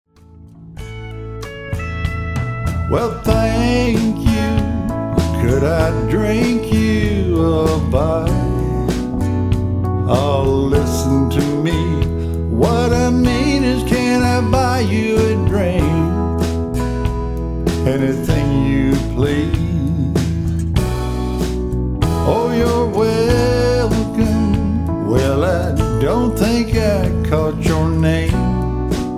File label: country band